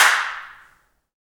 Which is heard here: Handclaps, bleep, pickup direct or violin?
Handclaps